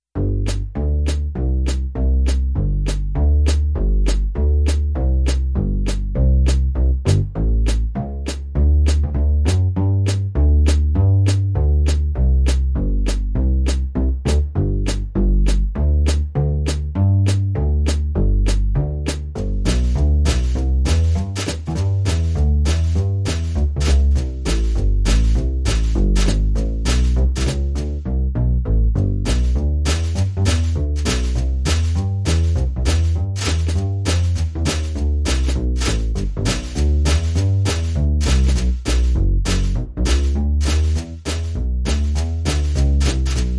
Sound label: Percussion and Bass Only